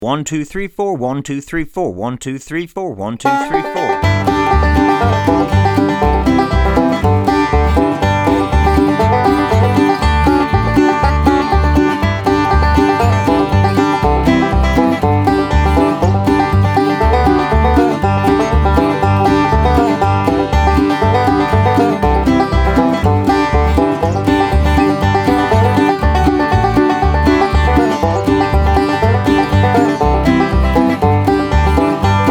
Swing Low Sweet Chariot Intermediate Banjo 120 BPM